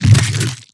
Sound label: nest death; monster within; soundmorph